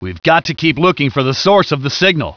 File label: landing area peace; gearbox; gunman